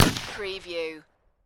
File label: Rifle Winchester 30-30 Single Shot Exterior At 45ft